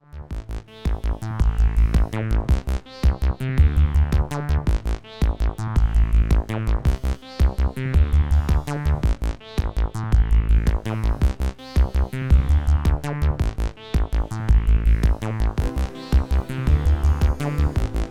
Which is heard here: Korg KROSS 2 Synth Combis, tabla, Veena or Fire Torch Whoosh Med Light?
Korg KROSS 2 Synth Combis